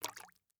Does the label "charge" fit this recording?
No